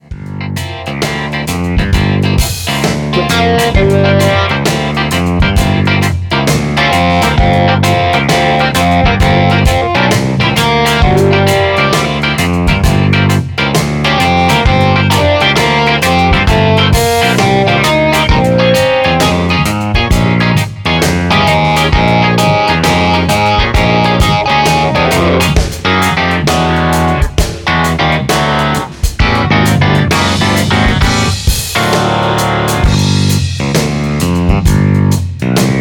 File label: Clavs; Soniccouture; Grind It Up